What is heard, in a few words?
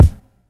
kick